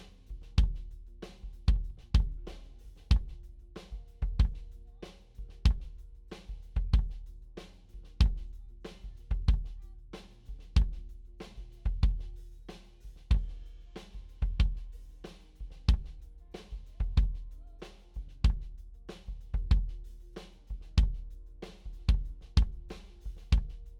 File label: kick frequency SOLO